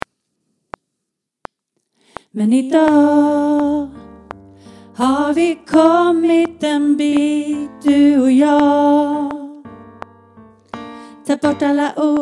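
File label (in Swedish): refr Sop, seniorkor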